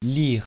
lyre

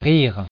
rire

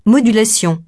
modulation

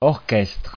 orchestre

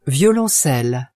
violoncelle

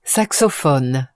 saxophone

